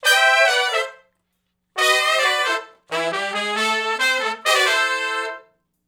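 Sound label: R&B Riff (A) harmony, Horncraft; Sony Creative Software; Crimson Blue and Fabulous Horncraft 4 RnB; Horncraft for R&B; Sections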